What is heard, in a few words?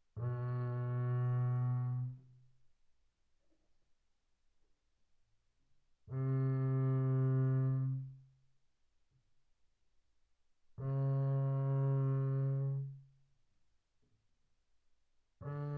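Bass arco sul E mezzo forte B2 E3, double bass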